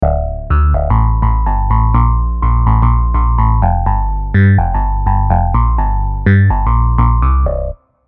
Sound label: Pathway, Dm, 125bpm, Basslines